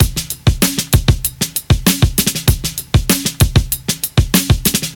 97 bpm drum beat f key, Free drum groove - kick tuned to the F note. Loudest frequency: 2957Hz